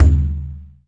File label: linn CONGA L; DRUM MACHINES